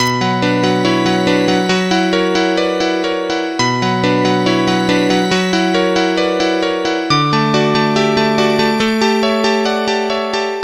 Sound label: Nokia Nocturne